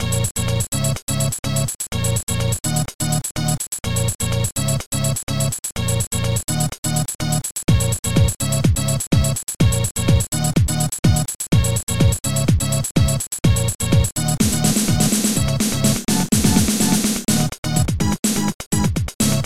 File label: rythm